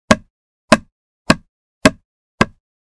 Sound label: Ball Peen hammer hitting wood surface